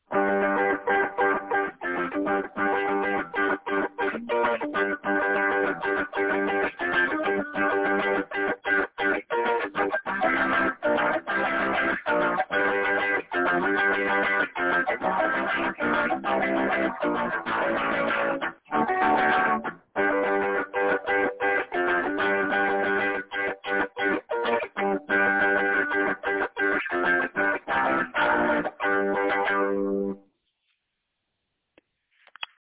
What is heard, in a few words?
unusual rock chord changes and rhythm